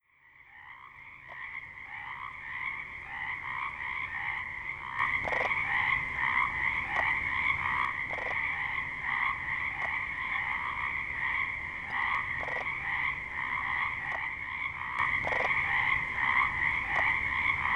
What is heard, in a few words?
frog music